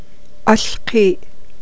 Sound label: snake